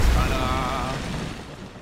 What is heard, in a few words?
Tada; Loki